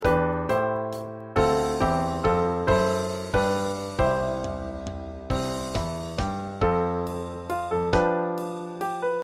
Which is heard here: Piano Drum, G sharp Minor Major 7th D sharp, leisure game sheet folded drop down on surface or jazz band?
Piano Drum